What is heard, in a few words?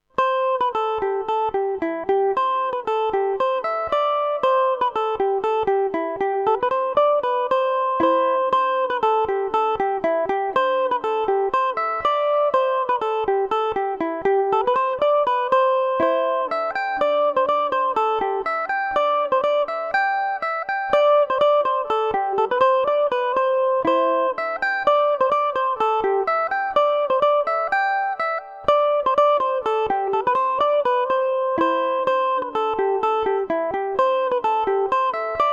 castle danger polka no 2 cmaj, mandolin